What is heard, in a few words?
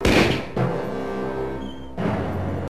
death; singe